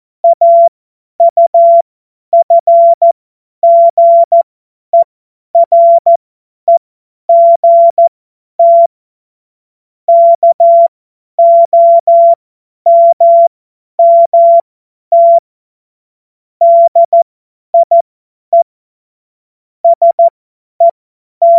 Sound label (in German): Witz, cw morsen; Morsekurs